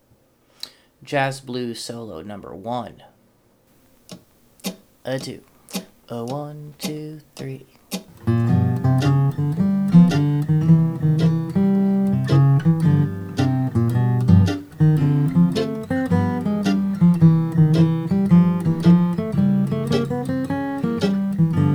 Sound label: Jazz Blues Etude